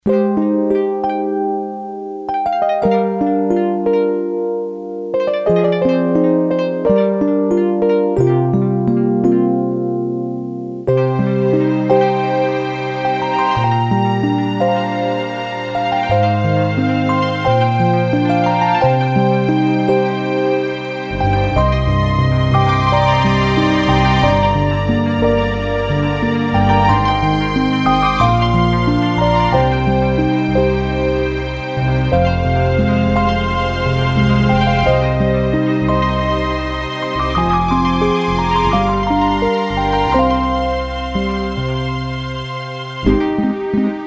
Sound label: bgm